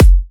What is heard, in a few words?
Electro Kicks